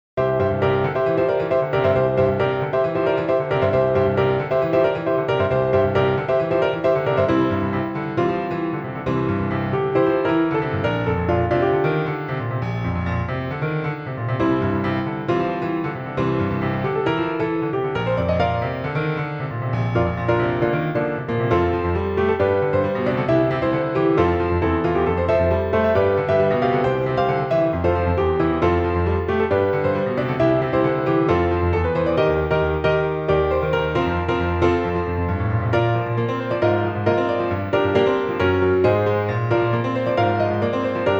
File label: piano; Magical Chase; Ivory YamahaC7